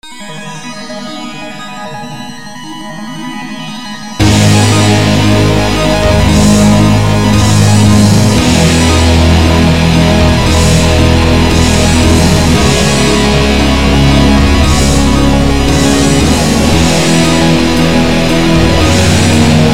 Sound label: Metal